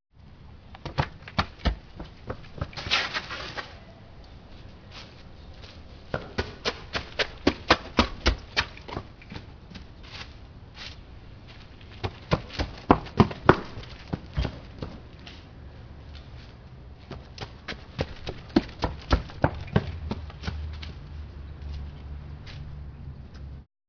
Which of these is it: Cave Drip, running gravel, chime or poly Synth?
running gravel